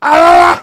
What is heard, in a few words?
heavy pain severe